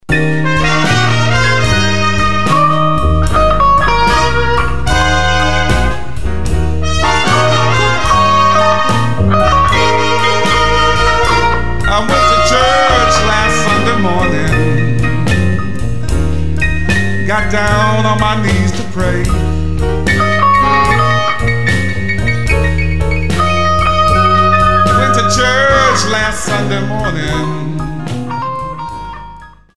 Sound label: sunday morning blues